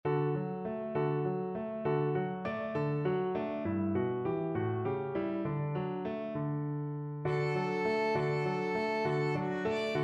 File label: what can the matter violin